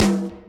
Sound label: tom, drums; virtual drum sounds; custom drums